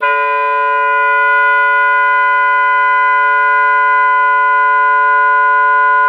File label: OBOE3; Xsample VOL-6